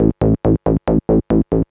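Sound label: SYNTH BASS DANCE 140 A; eJay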